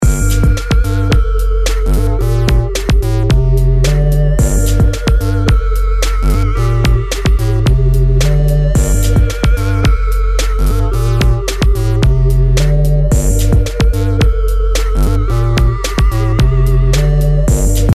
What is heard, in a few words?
synth and bass dry; multilfo